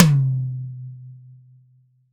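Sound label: LD High Tom, Roland BK-5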